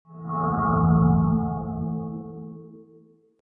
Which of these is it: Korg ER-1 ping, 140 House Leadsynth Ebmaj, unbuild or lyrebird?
unbuild